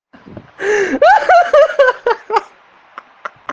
dando risada; preto

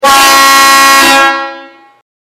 buzina atomica

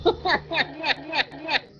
risada boa de mais

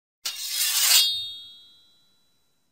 efeito sonoro espada

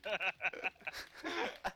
risada wolf

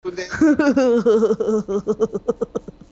risada

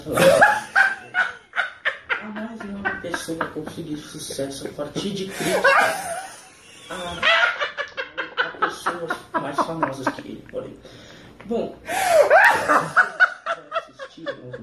risada histerica; Nando Moura

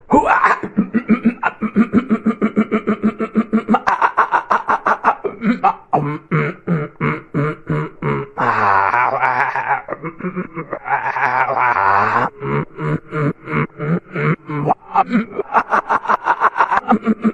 motor de onibus; ednaldo pereira

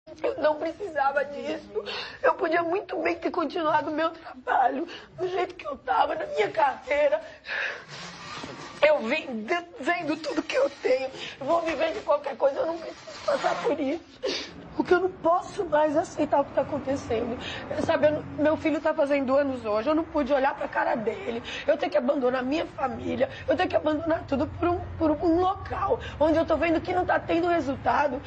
chorando; gretchen

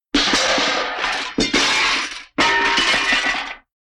panelas; Pans Falling

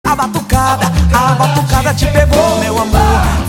abatucada

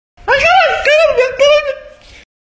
grito do macho

